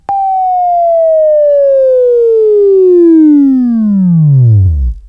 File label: WAV Right, Sweeping; Sweeping Training program